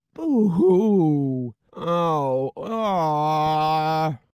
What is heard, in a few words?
Woman Booing; voice; Zomboid; Lifestyle